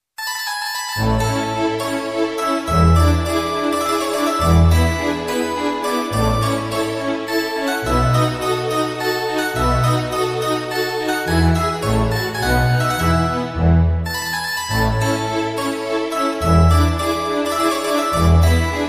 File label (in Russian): Рингтон(классика) - МИНУЭТ